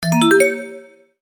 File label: alert